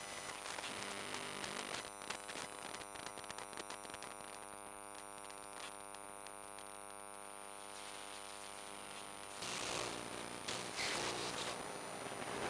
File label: Ambient Electric Room; Among Us